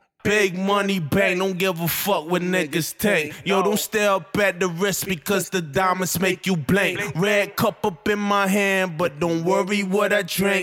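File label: hip hop 130 G minor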